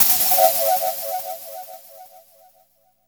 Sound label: TRANCESWIRL; Drums In Your FACE!